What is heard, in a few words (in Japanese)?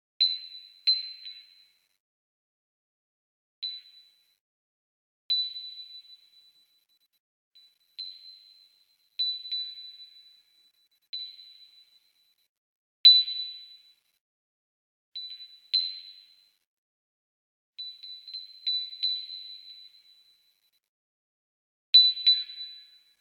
風鈴の音